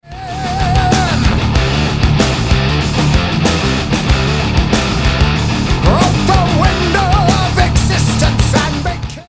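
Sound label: Chromatic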